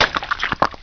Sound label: LAND; Quake 1; BLOB